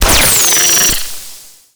Cube Vaporize, Machine Rifle; Warframe; Sentinel; Deth Machine Rifle

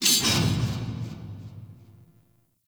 Close Metal Hits, Asteroid; Warframe; Grineer

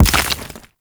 Mech Step Surface Dirt; Warframe; Nechro Mech; Step Surfaces

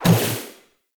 Guardian Shell Impact; Warframe; Focus; Vazarin

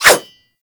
Dagger Whoosh; Warframe

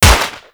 Uzi Shot; Warframe; Tenno

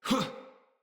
Attack, Battle Barks; Envoy

